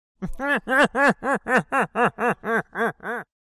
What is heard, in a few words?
Man nerdy laugh